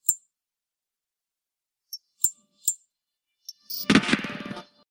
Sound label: ink teleport sound effect ringtone